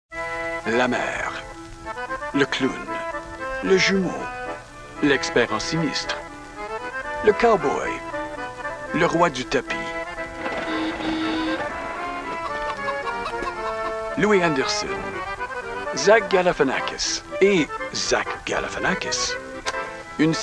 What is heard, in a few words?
basket sfx